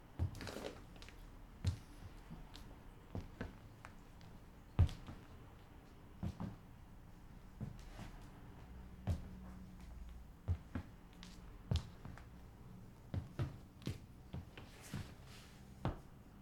Walking Slow Footsteps On Laminated Flooring Boots